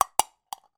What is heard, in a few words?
food canned tomato tin lid peel open